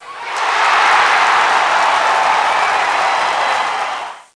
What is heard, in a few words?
win; Alien